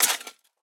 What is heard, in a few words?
hoe till; item; Minecraft; Conquest Default